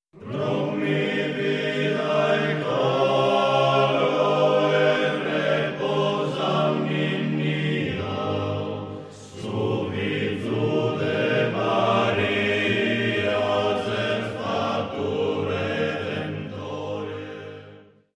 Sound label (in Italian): vida e coro